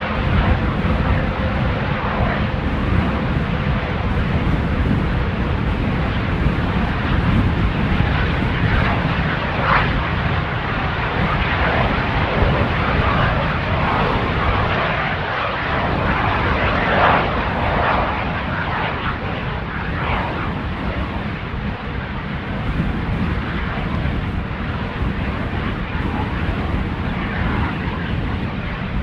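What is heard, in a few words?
nature wind strong howling power plant texas in background; felix blume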